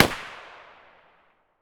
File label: ak74 muzzle far, weapons; STALKER; IWP